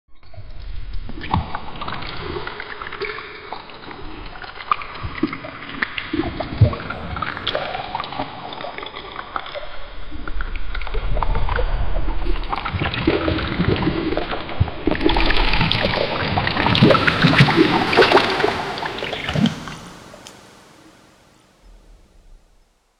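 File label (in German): Höhlengeräusche plätschern, cavern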